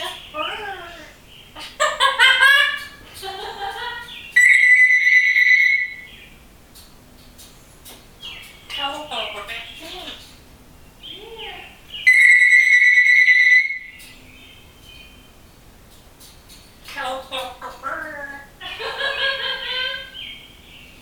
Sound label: Telephone for Bird